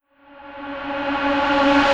reverse, vocal sample

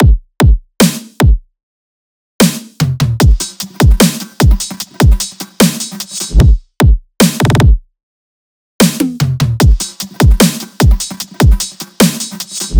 drums Wudalianchi 150, Full Drum Loops; drum sample pack; SEISMIC; Slate

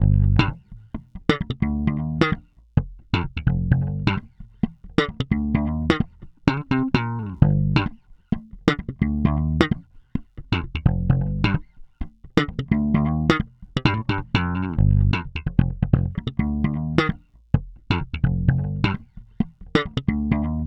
Bass loop 130 Gm, Electric Bass Loops Direct; Slate Digital BASSHOLE Sample Pack

